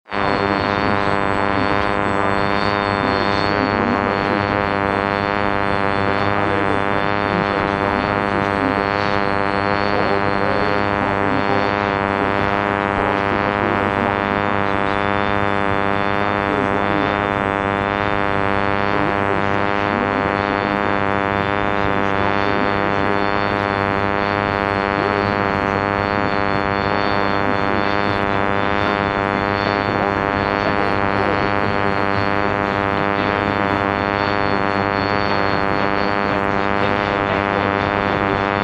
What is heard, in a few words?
raw sounds